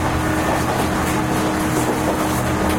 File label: 65 up tunnel; tetsuon